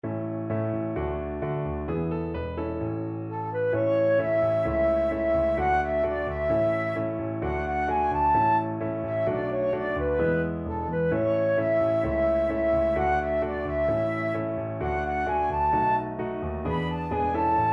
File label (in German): Playback A-Dur